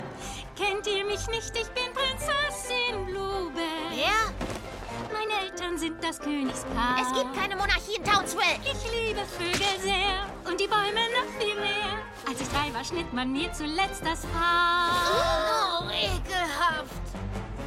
Musical Anime-Serie; Musical Anime-Serie PowerPuff Girls; Prinzessin B-1; Power Puff Girls - Gesang Musical

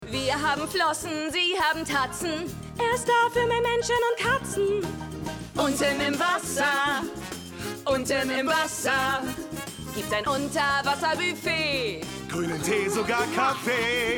Gesang; Der Gestiefelte Kater

